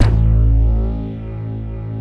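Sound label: TRANCE BASS, HOUSE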